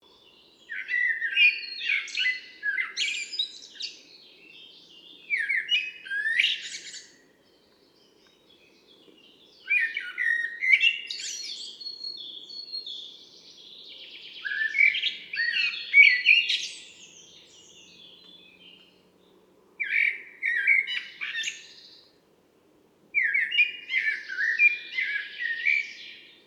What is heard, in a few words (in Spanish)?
Mirlo común - Turdus merula; ocells